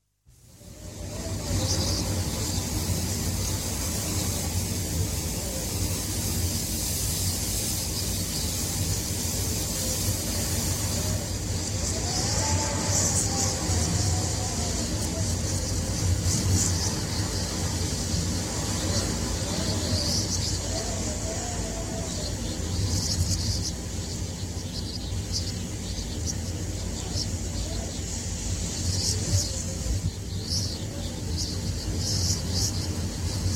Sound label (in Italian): Vento